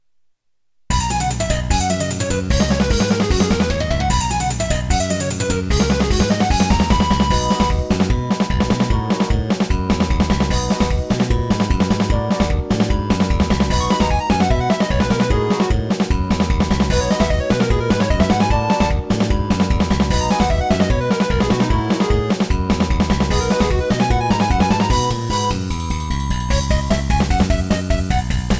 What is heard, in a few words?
1khz